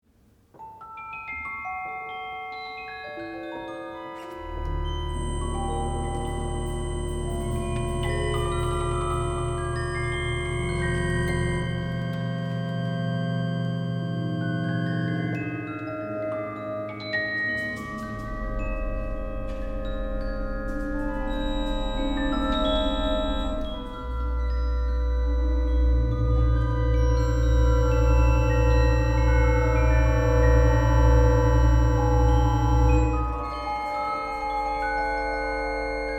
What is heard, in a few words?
Antiphonal Chamber Music; Huddersfield